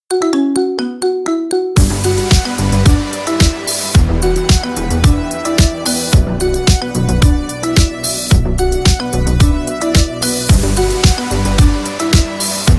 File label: marimba remix